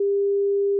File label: bleep deep